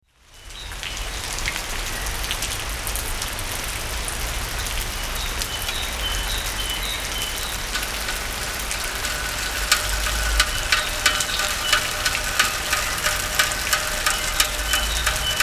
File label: Cairn Sound; CAIRN 4, St Thomas' Recreation Ground, Hackney